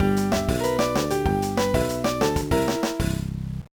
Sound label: Jingle, gba; Backyard Baseball 2006